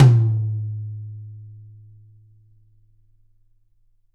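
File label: YAM 9X13 ROOM, Drums; Big Fish Audio; Ross Garfield Drums VOL-2; TUCSON KIT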